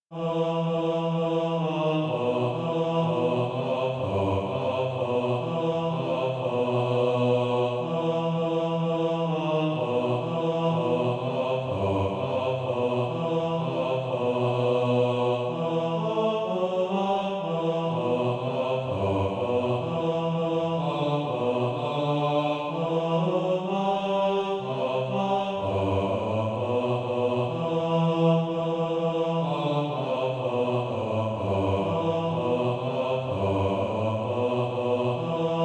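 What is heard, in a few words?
bass, SATB Practise Music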